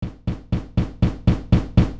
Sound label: acoustic